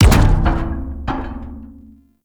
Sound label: Metal Crate Surface Impact; Objects